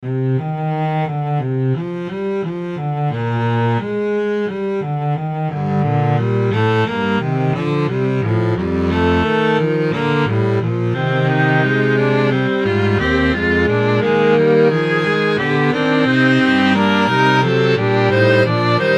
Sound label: fugue